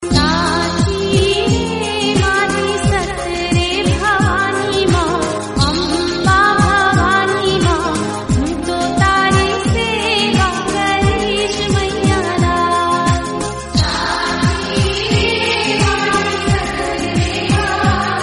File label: tone